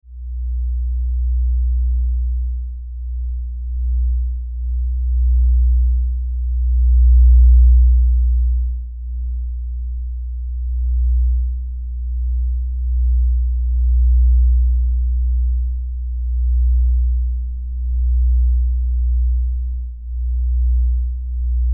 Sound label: Energy Source Pulsin, spaceship fx